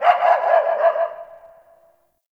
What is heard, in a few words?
Distant Ambient Dog Bark